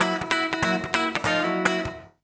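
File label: guitar, Drum & Bass, 145 BPM; Ueberschall; Drum & Bass Resonance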